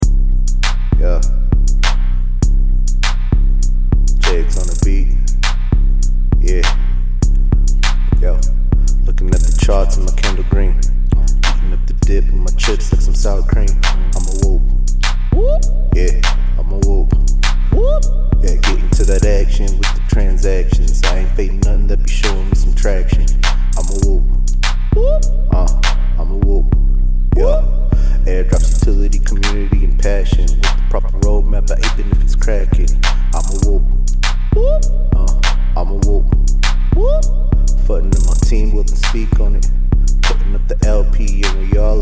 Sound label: beat